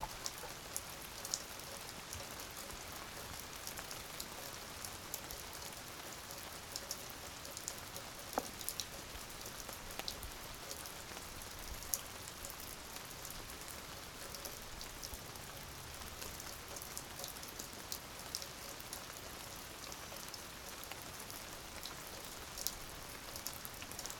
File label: Ambience Summer Rain